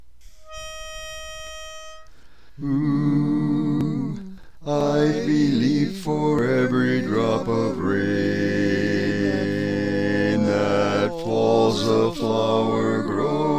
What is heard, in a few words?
Bass, barbershop